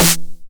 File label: 909 SD Tape Sat